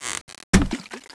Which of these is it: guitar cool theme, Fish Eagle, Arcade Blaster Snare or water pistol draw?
water pistol draw